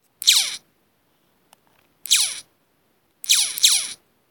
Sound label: Pew!